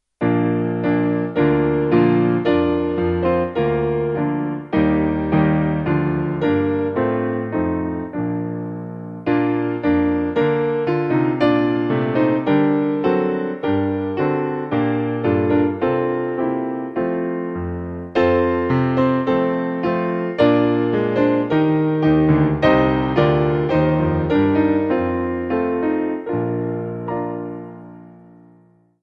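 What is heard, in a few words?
Piano, backing; Holy Father; Regent Sq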